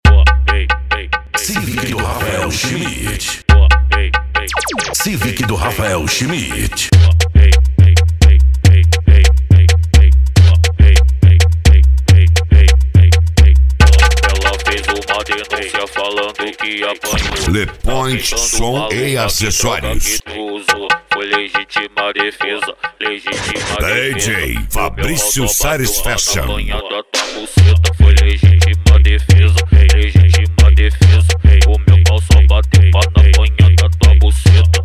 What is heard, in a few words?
funk mega bass